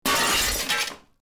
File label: Glass Breaks Pane; Breaking; Objects